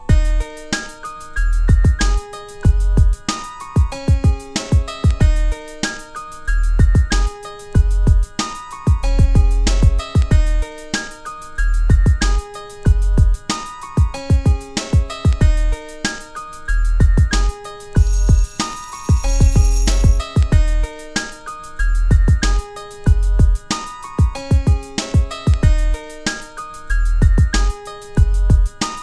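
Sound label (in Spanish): base rap para improvisar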